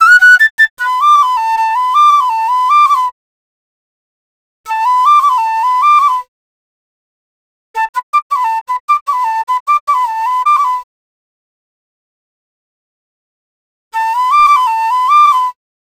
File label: EDM Flute Loop 155 BPM B